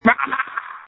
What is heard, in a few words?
Scream